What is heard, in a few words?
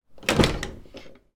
window close old fashioned, household